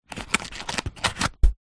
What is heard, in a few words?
foley cigarette packet close